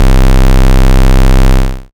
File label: YM Bass C; ChipShop Deluxe; ChipShop Hits